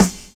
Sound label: DISCO SD, SNAR; USB Soundscan vol.11; AKAI